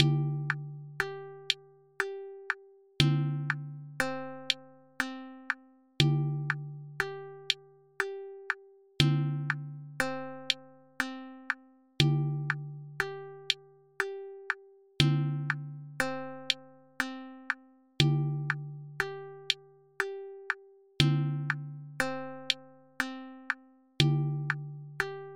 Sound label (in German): Klingelton